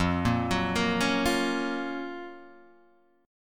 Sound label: Gb Major 7th F, guitar